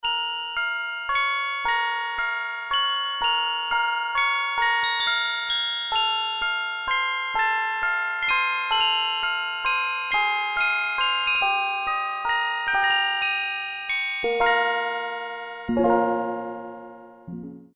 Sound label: Music Box, Korg Poly-61M